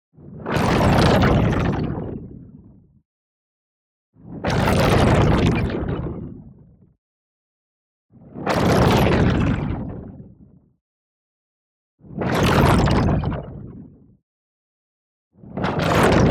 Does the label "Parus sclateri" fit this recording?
No